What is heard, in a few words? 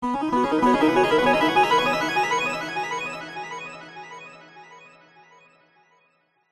sound design magical rising twinkling nostalgic